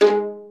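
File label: pizz violins; Proteus2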